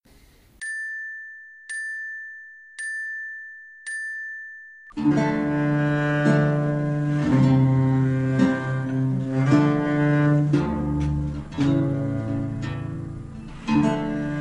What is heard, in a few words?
Greensleeves click in d